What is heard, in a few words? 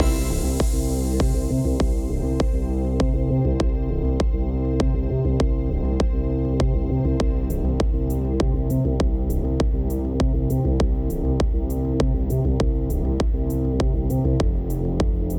Music Driving